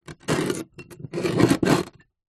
foley glass bottle movement on dirty table

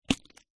food salt mill plastic lid remove; zapsplat

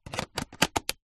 camera dslr lens on twist click canon eos 6d; technology; zapsplat

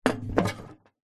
foley metal box large pick up handles

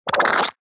cartoon squelch

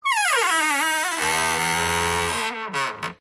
Wood Stress Creak Squeaks; DPA4060; pmsfx

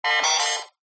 science fiction robot android beep chirp classic

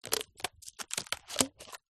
balloon skin stretch handle; foley

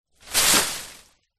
foley palm tree leaf land ground

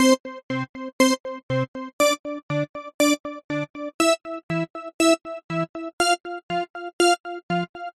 game retro musical timer loop

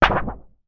impact metal sheet thin wobble; zapsplat

